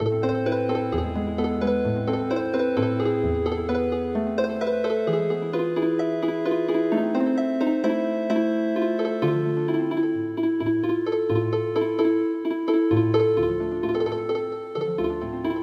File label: Healing Harp